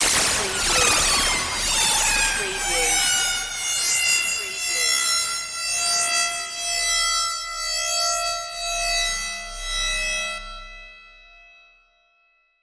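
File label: Fried Laser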